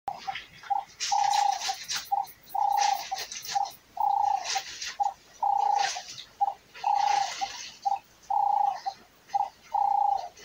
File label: ring necked dove